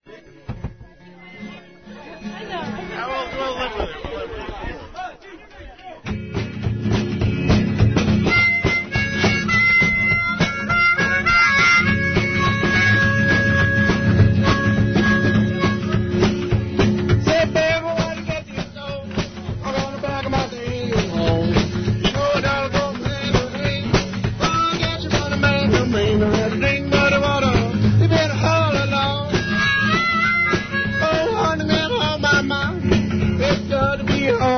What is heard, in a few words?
muddy water; minidisc